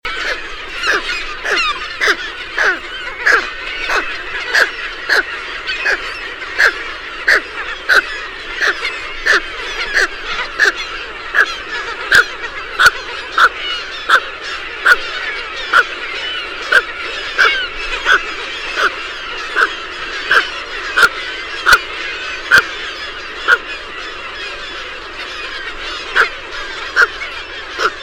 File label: Pelican Sound